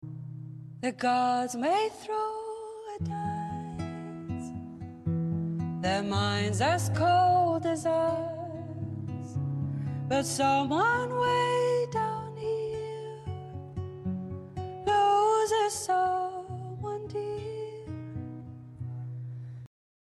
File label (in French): solo voix seule